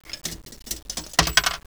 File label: coin drop sounds coin